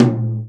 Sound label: tom, Real Drum Kit, jungle kit